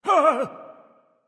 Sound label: surprise; chronos